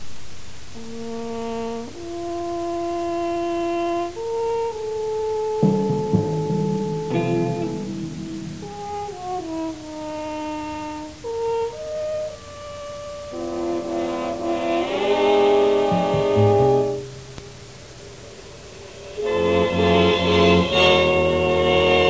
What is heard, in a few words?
dramatic